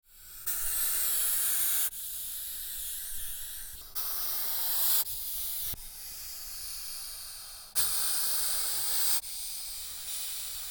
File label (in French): viper souffle